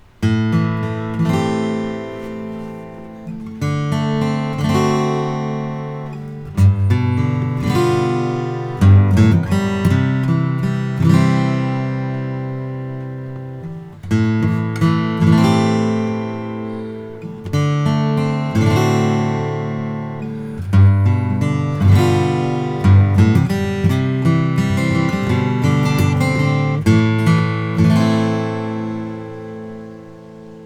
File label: Goodall traditional 000; natural